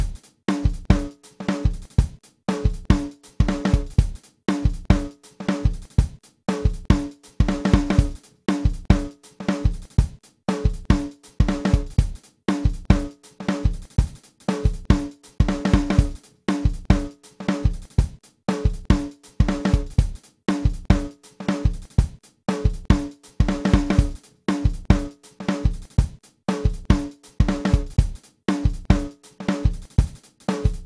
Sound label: sampled drums impressive